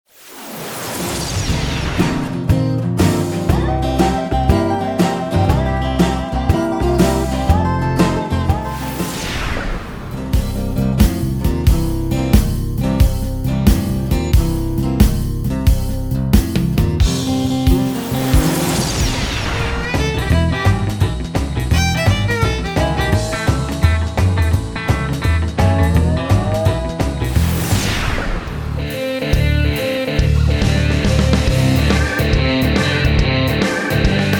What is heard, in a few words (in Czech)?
ukazka blues